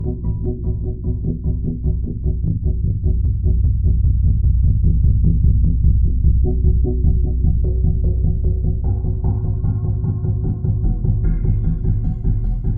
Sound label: Forest Keys